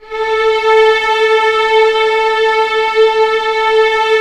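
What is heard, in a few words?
strings A3 FF L; Sound On Sound - Peter Siedleczek's Orchestra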